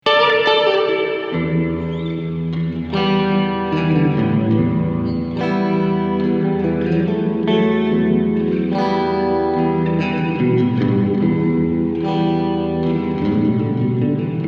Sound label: Verb Flanger